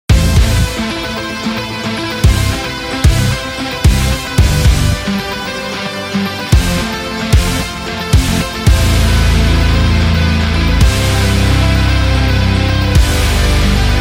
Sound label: Rock Opener; gym rock